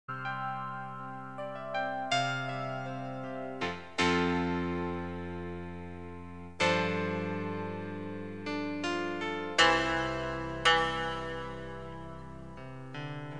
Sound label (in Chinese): 广告片音乐